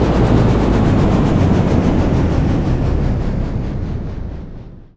fan off; CounterStrike; cstrike

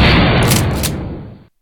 pump shotgun; factorio; Factorio 0.14.21

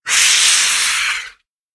biter roar long, creatures; factorio; Factorio 0.14.21

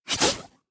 spitter; factorio; Factorio 0.14.21; creatures

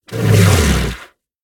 worm roar long, creatures; factorio; Factorio 0.14.21

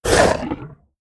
worm death; factorio; Factorio 0.14.21; creatures

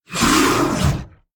worm roar; factorio; Factorio 0.14.21; creatures

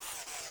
inserter fast; factorio; Factorio 0.14.21

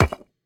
deconstruct bricks; factorio; Factorio 0.14.21

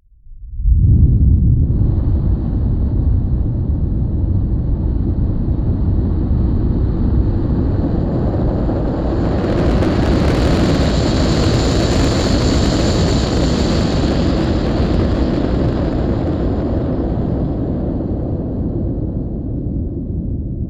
silo rocket; factorio; Factorio 0.14.21

